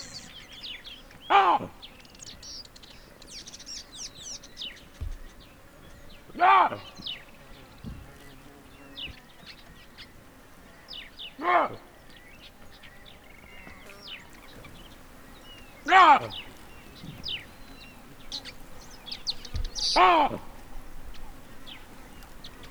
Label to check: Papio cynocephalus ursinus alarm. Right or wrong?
Right